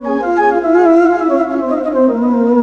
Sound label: SYNTH, ARABIC; USB Soundscan vol.43 - ArabianTraditions; AKAI